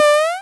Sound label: jump; 馬利歐素材; 軟硬整合